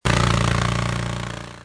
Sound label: lb Show; merge-dragons-2